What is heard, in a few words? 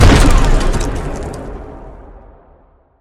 nuke